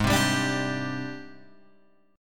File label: Ab Major 7th Flat 5th Ab, down, Guitar, Open G